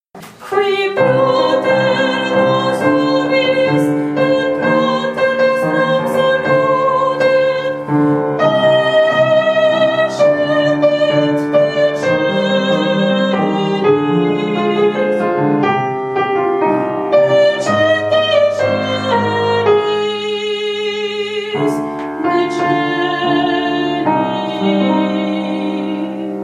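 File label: soprano; credo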